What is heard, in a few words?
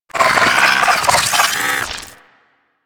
Amalgam Moa Satyr Vocal Idle; Warframe